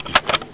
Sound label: scar change